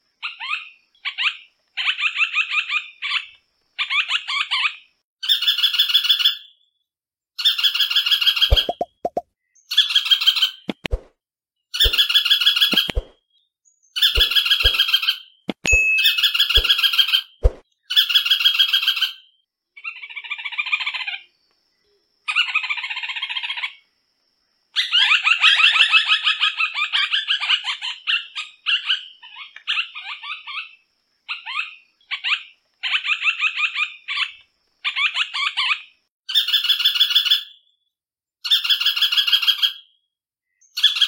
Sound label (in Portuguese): canto do trepador de olho branco